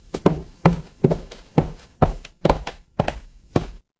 footsteps stairs

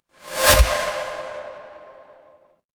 transition whoosh

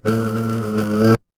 feedback error

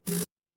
office phone vibrate

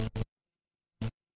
feedback error

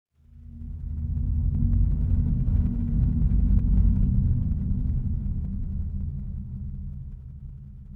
horror ambience dark

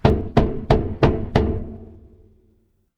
door knock

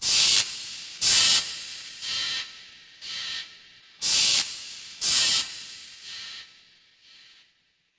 fire electric zap

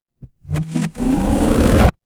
transition riser

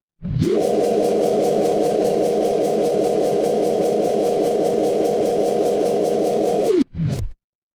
mechanical machine

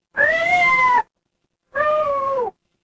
animal cat meow

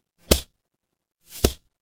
transition braam

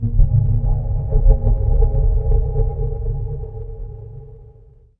magic scifi alien

